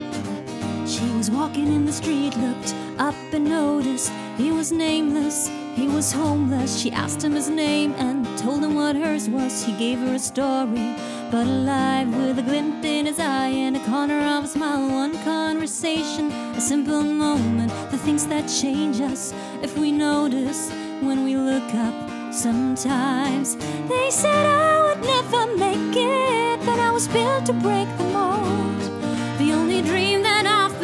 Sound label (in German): Pop Englisch, Gesang Pop englisch; Vocals (Gesang); English US; LOFT VOICES